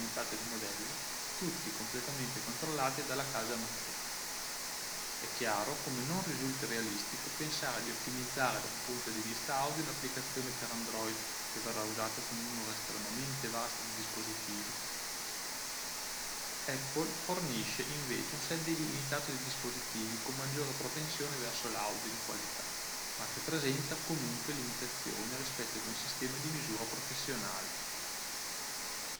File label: Noise Test omni mic, Noise+Speech